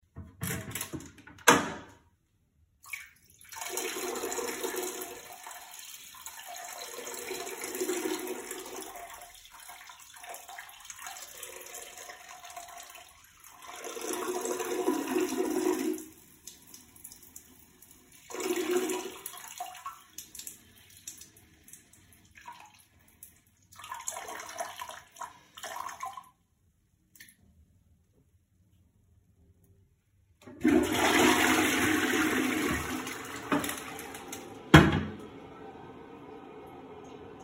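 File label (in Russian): zvuk pisaniya v unitaz i smyv, sound of peeing in a toilet and flush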